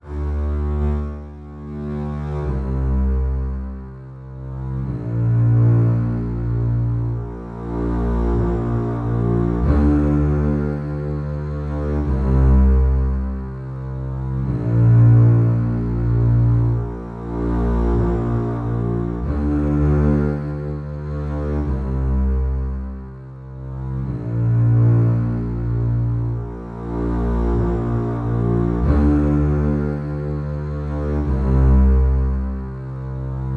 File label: bass strings